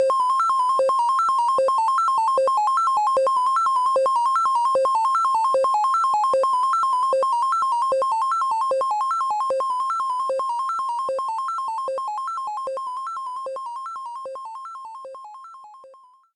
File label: In Game; Pac-Man 99; Xevious